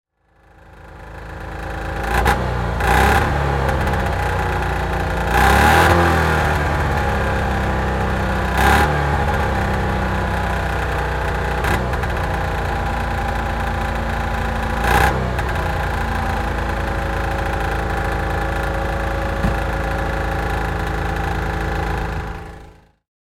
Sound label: Panhard 24 CT, oldtimer